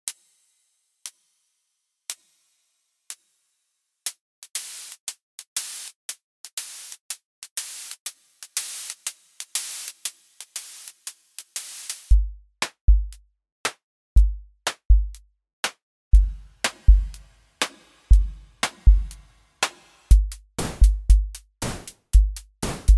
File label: 2 Bars Dry 2 Bars Wet, mono synth; reaper